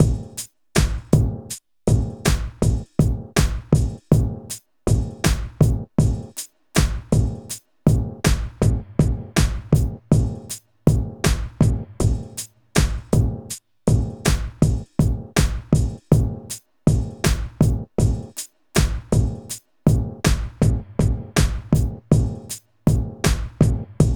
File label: HIPHOP; INIS; Funky Xtreams